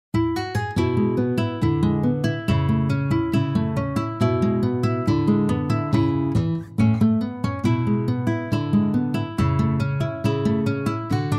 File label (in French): BALLADE ACCOUSTIQUE